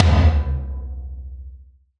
Tom, Gated Reverb; musicradar

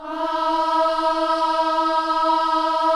KIDS CHOIR, Gospel; Spectrasonics; Vocal Planet

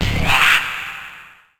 Godspit Lo, Percussion Hits; Zero-G; Soundclash; Acidized Wavs

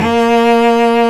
ARCO CELLO, Solo String; E-MU Producer Series Vol. 2; More Studio Essentials; Composer; Solo String Combo

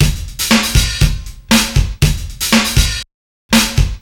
house live; Sonic Foundry (Sony Creative Software); Ugly Remnants VOL-2

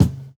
Kick, jungle breaks drum sounds

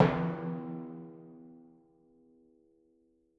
Timpani, Orchestral Percussion One Shots

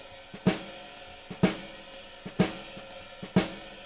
SWING, Living Drums

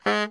BARI FF G#2, Sax; Giga Samples Collection; BARI STEREO

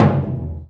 LOUD DRM, BURUNDI; Spectrasonics; Heart of Africa

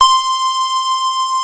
FUZZ LEAD, PPG, Analog; USB Soundscan vol.49 - Analog Fantasies; AKAI

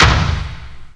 hit, PERC; Techno Trance Essentials

